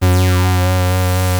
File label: OB 19 F#3, Rare Synthesizer; Hollywood Edge - Giorgio Moroder Rare Synthesizer Collection; OB 4 VOX.